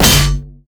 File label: basic attack; renekton skin06